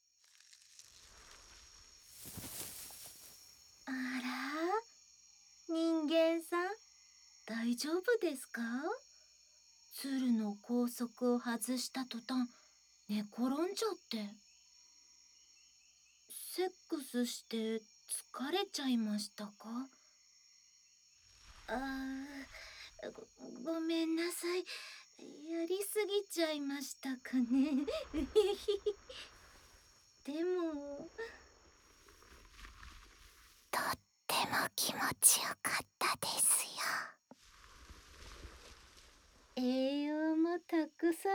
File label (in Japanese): ツルマッサージ, ASMR; 葉っぱの上で休憩